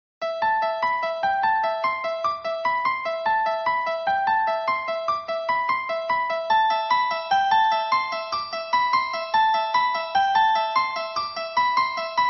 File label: tubular bells